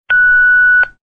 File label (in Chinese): 屏蔽脏话